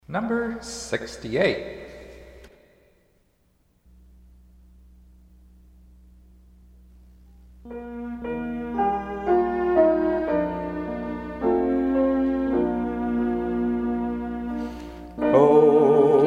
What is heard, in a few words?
Hymn